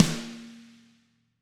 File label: Snare Hard